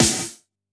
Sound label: Elec Snr, drum